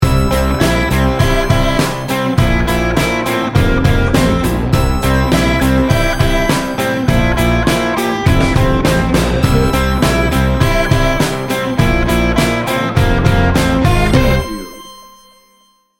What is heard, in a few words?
laidback indie rock